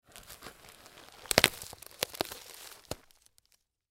Package Open, Package Open Plastic Wrapper Tear Off Crinkle; Foley; SoundEffects-Blastwave